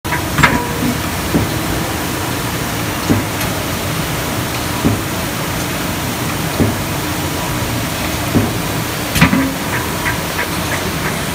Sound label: abnormal cue, anomaly sound